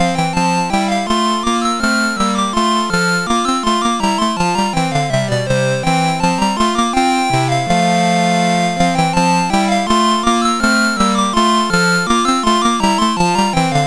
Chor, MOS6581R4; Peasant Cantata BWV212 No20

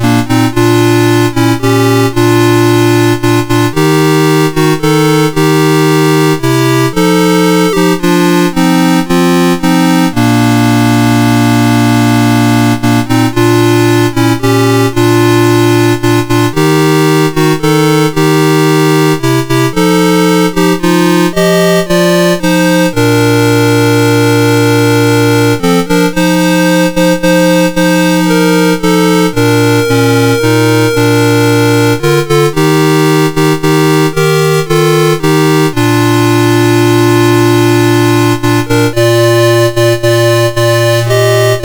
Old Rugged Cross MOS6581R2

